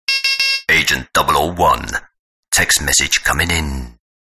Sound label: Agent Sms